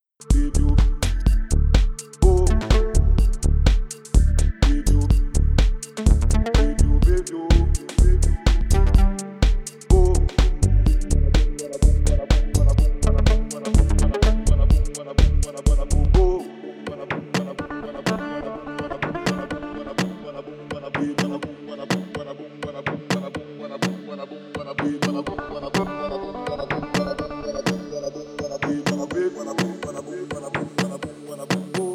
Ohne Ozone

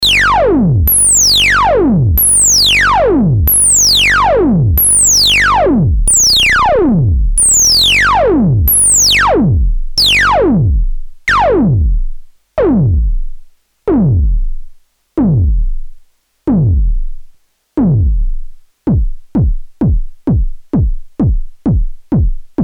Effekt und Bassdrum

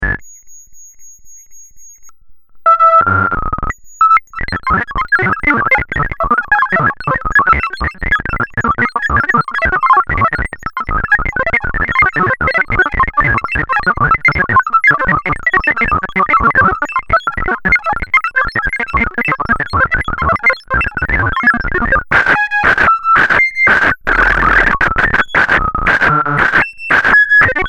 komplexe Modulationen

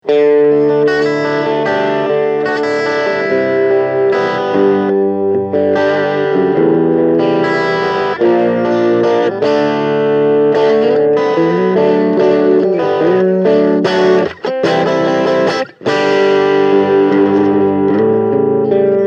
Crunch Modus

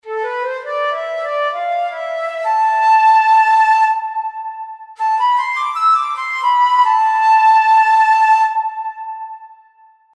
Flute gedoppelt

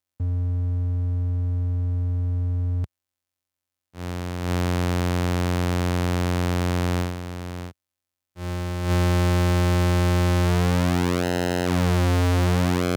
Wellenformen Tri Saw Puls Sub Mix

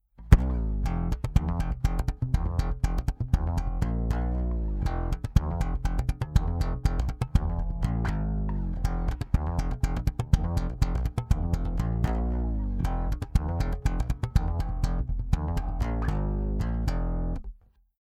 Eich T1000 Slap mit vollem Compressor und Mid Boost mit Godin Fretlessbass

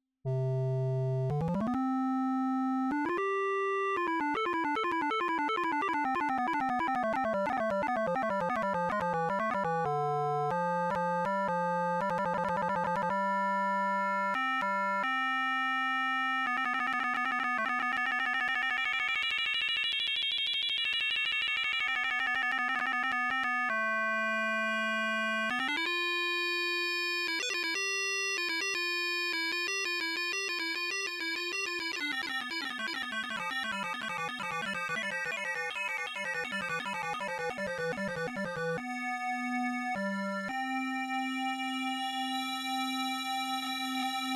MicroFreak Soundbeispiel Harmonic OSC

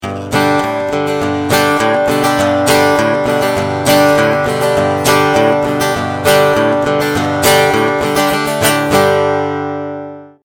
Country Flat EQ ohne Cool Tube

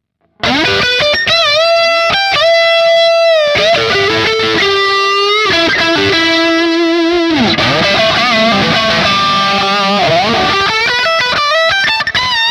Distortion Leadsound Hals Stegpickup

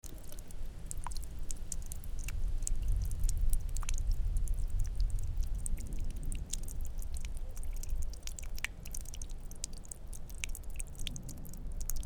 Wasser

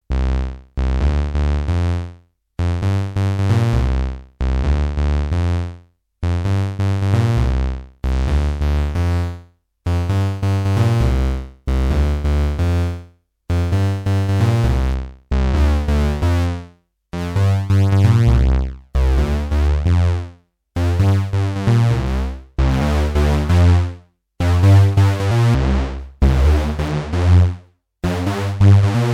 VX90 OSC dick machen

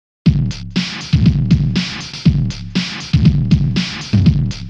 Effekt Guitar 80ies Rhythm

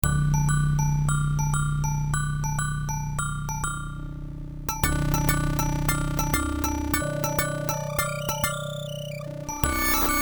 Blast Beats Synth Voices manuelle Modulation; Twisted Electrons

